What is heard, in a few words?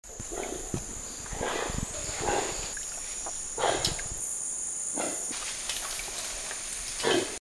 Bark